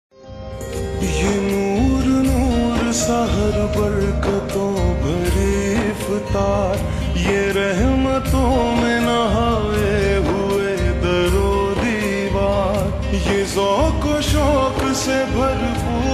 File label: Slowed reverb Ringtone